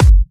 Hard Kicks; Vengeance Samples

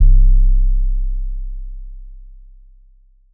808 Boom

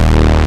Bass Cuts; Vengeance Samples; VES1 Instruments

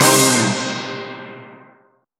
Synths C; Vengeance Samples

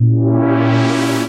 Trance Sweeps; Vengeance Samples; VEC2 FX

